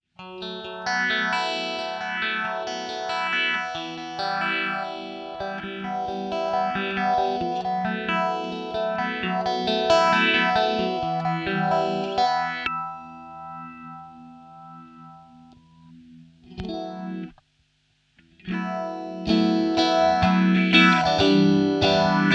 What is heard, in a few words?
ultra phase shifter; behringer pedales guitare